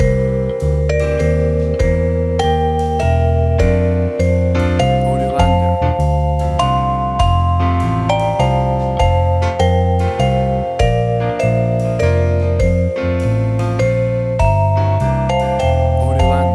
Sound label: Away In A Manger Christmas Jazz